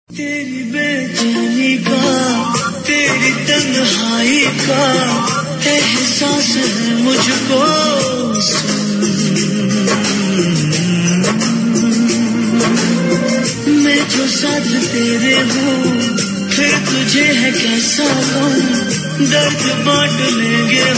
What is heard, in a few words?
indian love songs